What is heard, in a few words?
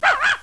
dog bark